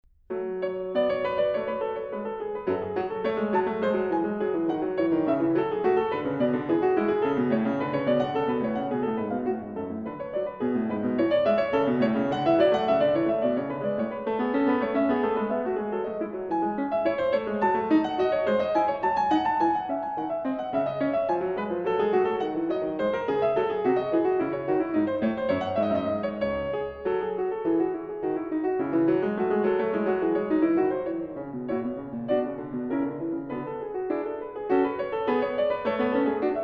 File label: Prelude in F-sharp minor; 14. Prelude in F-sharp minor, BWV 859; The Well-Tempered Clavier, Book 1; Angela Hewitt